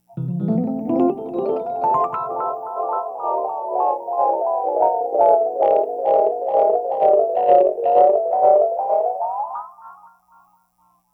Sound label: Rhodes